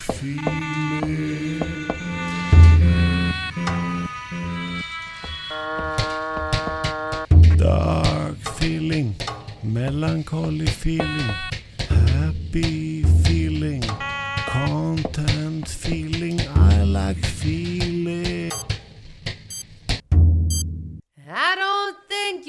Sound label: casiotone